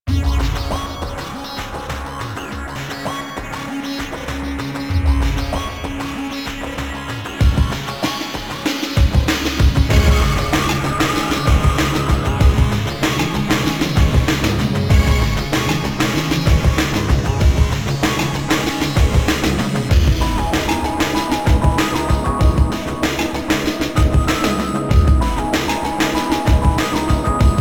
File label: Music Level Boss; Warframe; minigames; Caliber Chicks minigame